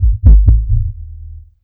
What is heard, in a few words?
Bumpers; Sonic Foundry (Sony Creative Software); Esoterik Beatz; Messup Fx